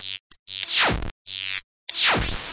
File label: 95 effect present electric shocks, drum loops and breaks; 091 to 100 bpm